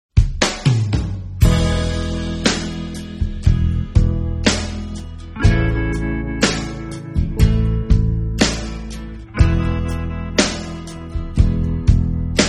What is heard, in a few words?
jungle